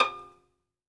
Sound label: Synclavier Strings, SOLO VI; ILIO